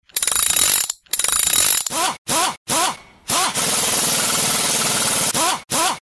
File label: nitro; cpf painelveiculo